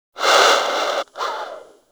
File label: Bird Snore; Hunting Critters; Sawgaw